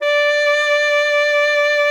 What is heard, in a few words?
D5, ALTOSAX; Xsample VOL-2; 90 s Sample CDs